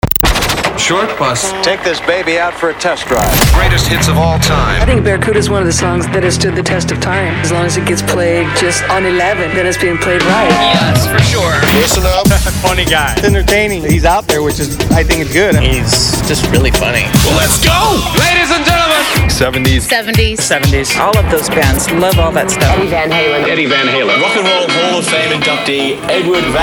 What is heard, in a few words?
Classic Hits